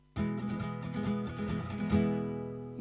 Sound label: Classical Guitar Solo Track